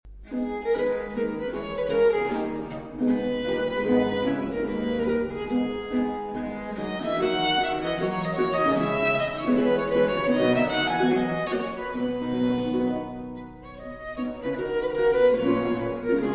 Suonata en la Mineur, Gavotte; Jacquet de La Guerre; Allegro

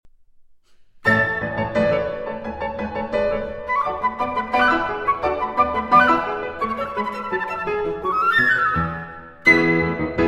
Sonate Pour Flûte et Piano, Presto Giocoso; FP. 164 III.

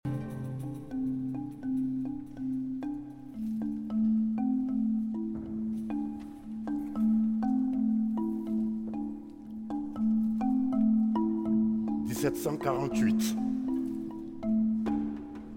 Musique Marimba

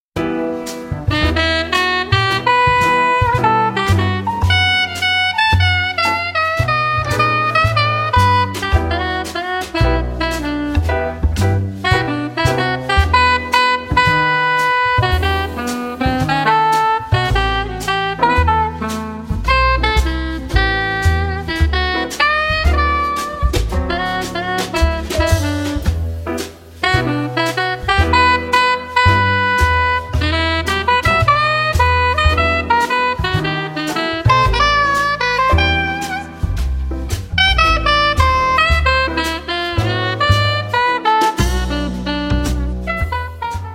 Jazz Français Chaleureux

